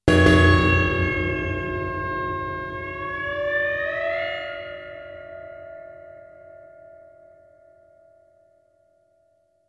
Orchestral Phrase; FXHome

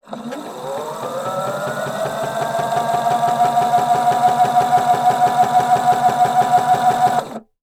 Sewing Machine Seam Sewing Slow Distant; InspectorJ

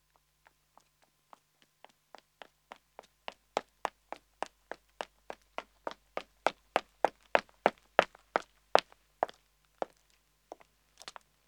Pavement Running man approach; Footsteps; BBC Sound Effects Library

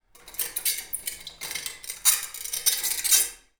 Glass Scrape; 99Sounds; The Warehouse

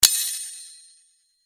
Sword Sound; FXHome; Fighting Sounds

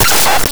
Mid Perc, Data Mosh Hits; 99Sounds; Mangling Audio

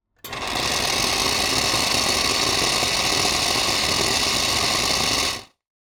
machine hot water, Bar and Coffee Shop; SpillAudio

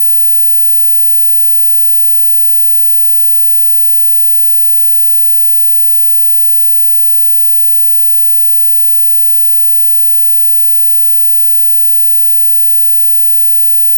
Hum, Feedback Loop; Glitchedtones; Feedback Loop FX & Atmospheres

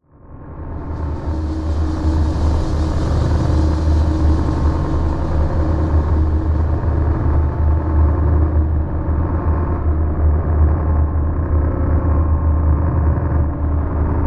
drone ambience; Bluezone; Dioxide Cinematic Soundscapes and Sound Effects

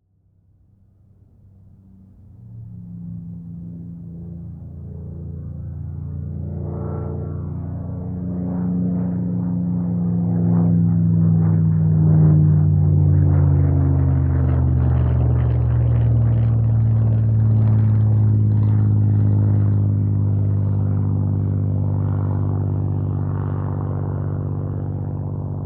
Spitfire Mark XIX, Over Head Aircraft; Timothy McHugh

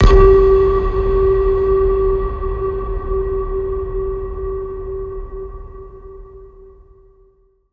Ping, Trailer; Federico Soler Fernandez; Effective Trailer Pings

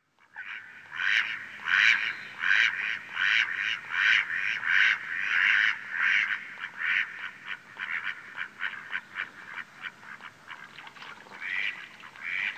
Lake Early Spring, Early Morning; Rural Soundscapes; BBC Sound Effects Library

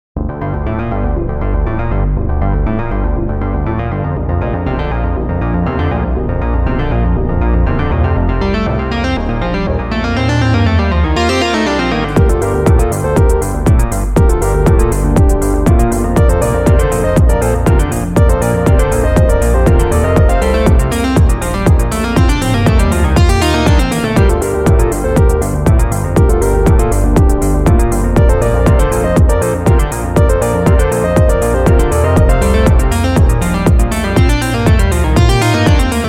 Techno

